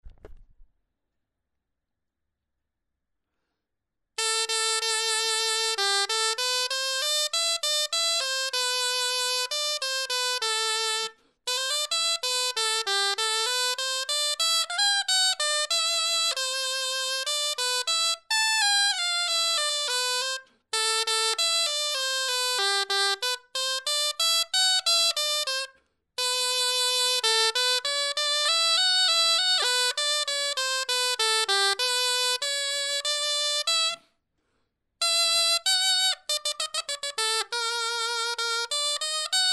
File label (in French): clarin etude